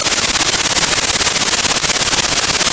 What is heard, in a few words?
flutter